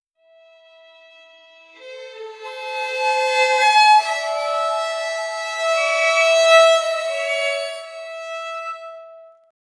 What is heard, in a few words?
violin screech